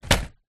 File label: foley card advent calender down on surface